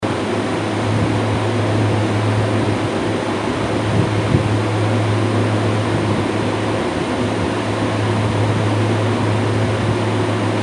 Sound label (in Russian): zvuk kondicionera